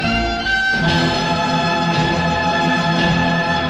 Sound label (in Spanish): segundas 3 notas; esas tres notas, la última más larga